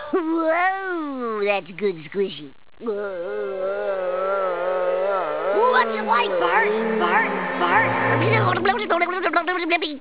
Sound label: squishy